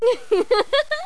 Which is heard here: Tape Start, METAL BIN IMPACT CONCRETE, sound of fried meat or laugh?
laugh